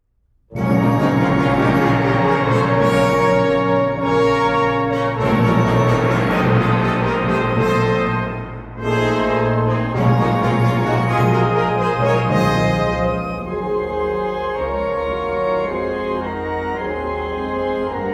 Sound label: Concerto For Alto Saxophone And Wind Orchestra